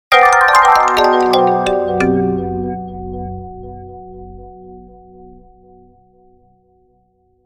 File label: Marimba Mallet Multiple Delays